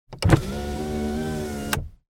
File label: AUTO JEEP POWER WINDOW